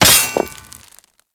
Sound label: Dagger Stone Impact; Warframe; Dual Daggers; Hit Effects